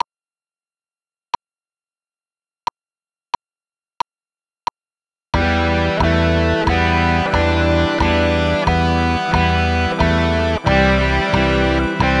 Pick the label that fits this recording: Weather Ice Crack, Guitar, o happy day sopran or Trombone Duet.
Guitar